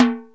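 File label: SP timbale; Crypton - DrumBase5000; SP1200 PERCS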